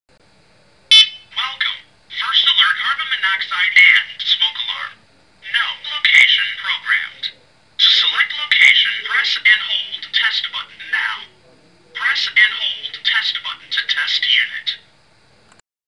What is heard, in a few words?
start up; SCO500B